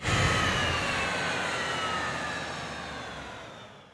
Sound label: jet off; Mafie 1